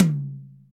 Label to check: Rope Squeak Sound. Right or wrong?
Wrong